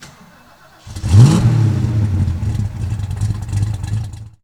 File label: 69 Mustang Start, Automobiles